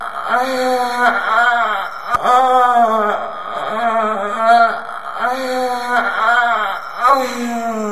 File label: female orgasm